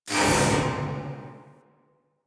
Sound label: Galleon Electric Amb; Ambience; Warframe; Grineer Galleon